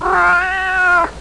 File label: demon